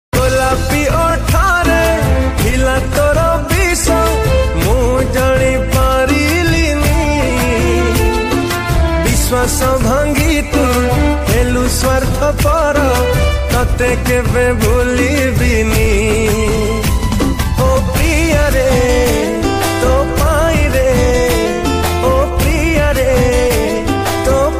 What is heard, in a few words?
Sad Odia Song Ringtone